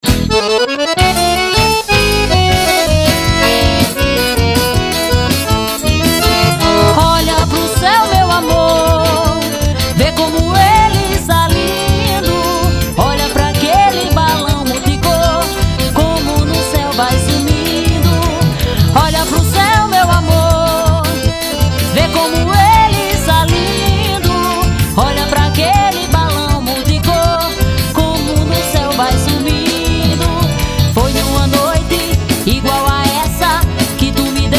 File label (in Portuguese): acordeon